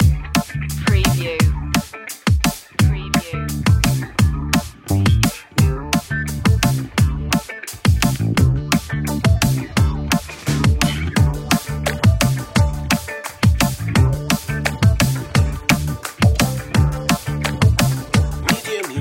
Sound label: urban funk